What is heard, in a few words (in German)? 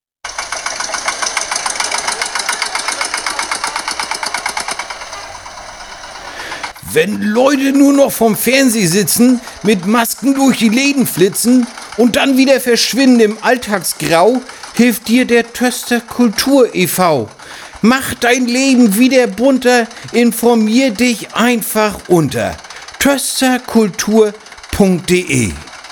Jingle; Kultur EV